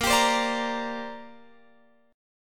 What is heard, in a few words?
A sharp 6th Add 9th chord, Guitar, Open F tuning; A#6add9; strummed; x,17,19,19,17,17